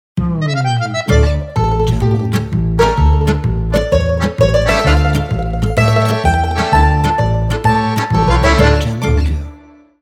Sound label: Italian Folk Logo